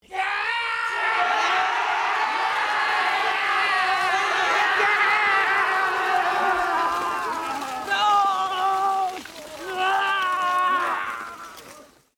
japan war-cry

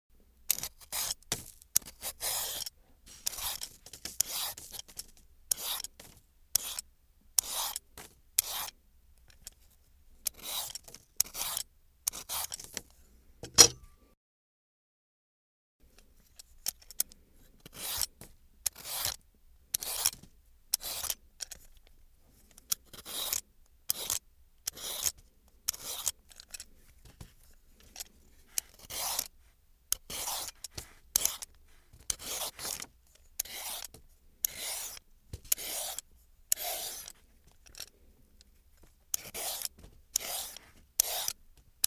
carrots peeler

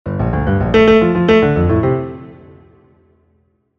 logo piano

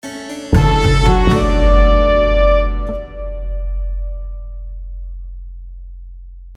logo orch

